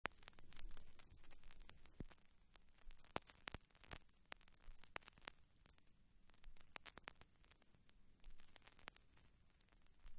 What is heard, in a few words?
crackles, Vinyl